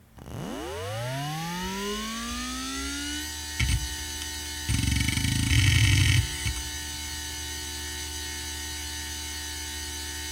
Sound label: Hard drive Spin Up